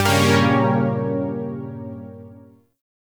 Shawzin Phrygian Chord H; Chords; Warframe; Zariman

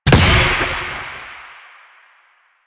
Choir Erupt Proj Explosion; Warframe

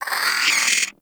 Hyena Micro Vocal Angry; Warframe

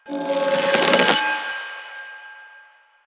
Choir Bow Charge; Warframe; Weapons

